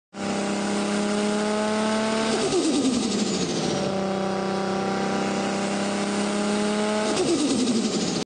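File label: Nissan Skyline, car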